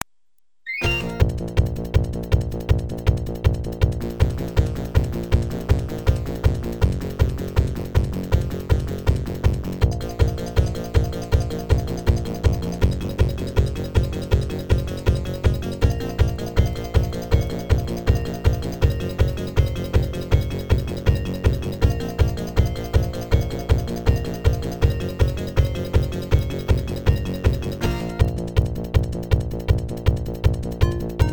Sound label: battle techno remix; tres